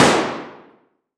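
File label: Weapon Gun Shot Gun 12 Gauge Benelli Automatic Single Shot Interior Shooting Range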